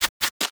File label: emote dance; quinn